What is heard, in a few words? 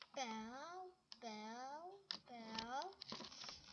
bell